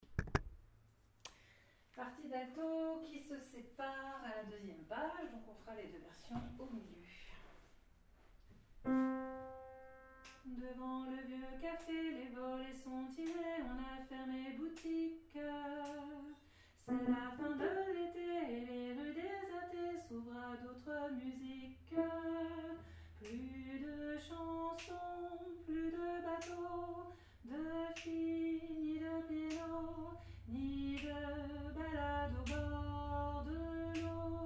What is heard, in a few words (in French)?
Alto